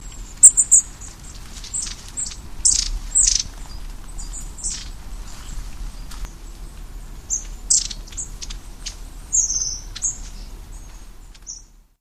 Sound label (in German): schwanzmeise